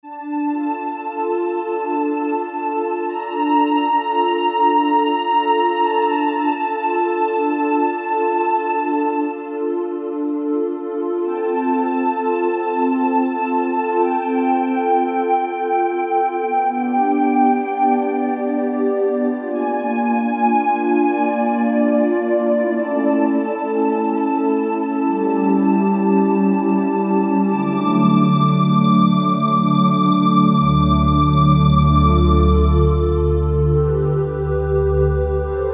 Delicate Pad

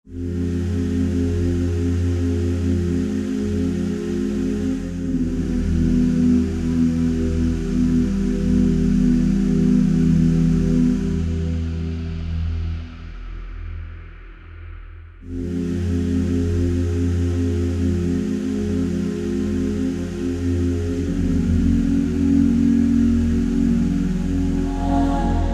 Choir Ooh, synth

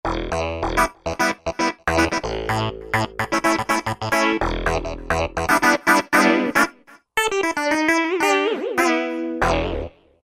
Digital Clav, Roland JD-990; Factory Patches

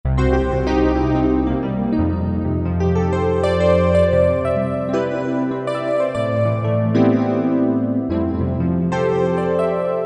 Stero EP, Korg MSC-1S; Korg MSC-1S Variety

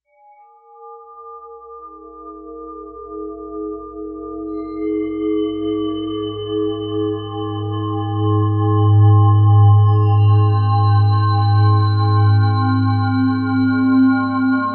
SIN RING, synth

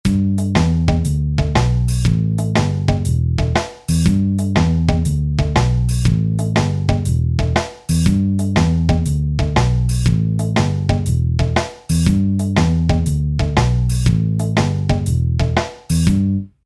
Pop

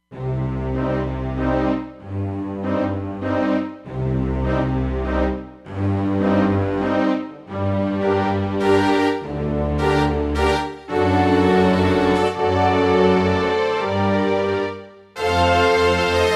Dynam`Orch, Roland Fantom XR

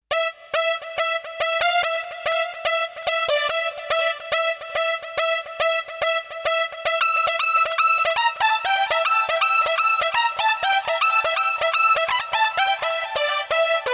Euro Lead, Kawai K5000S

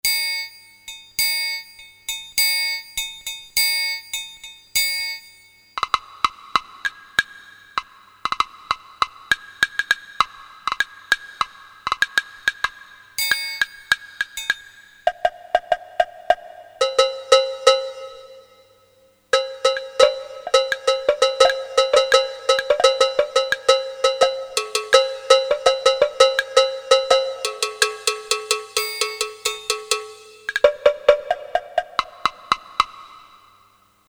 TR,W&B, Latin Percussion, Korg DSS-1

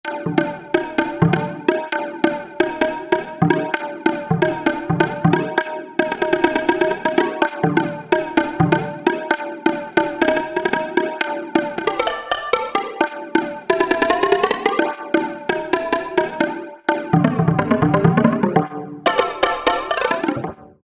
Perc. Wave, Korg MSC-2S Synth; Synth 1

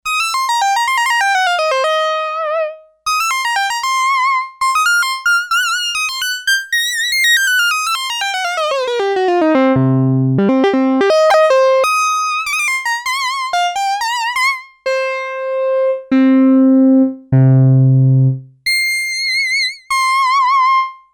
Lead 2 unison, Sequential Circuits Six-Trak; Factory Programs

